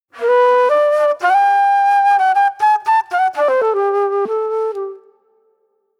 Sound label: Sweet Soul Flute; Sonic Foundry (Sony Creative Software); Manhattan Flutes; Good Times